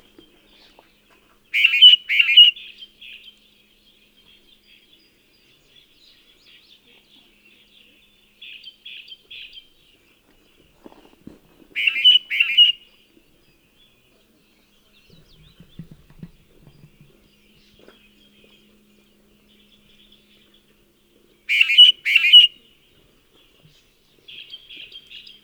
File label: mating